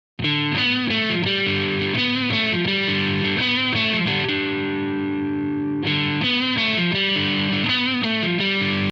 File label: processed guitar